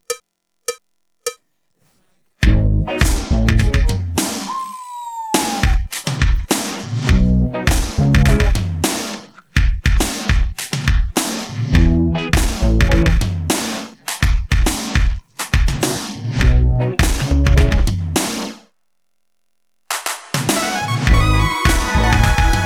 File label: instrumental